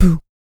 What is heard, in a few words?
HIT, Jazz FX FEM PERC